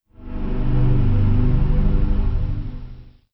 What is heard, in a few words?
Windows Startup; Windows 76 Release; Windows Never Released Soundpack 2; deviantart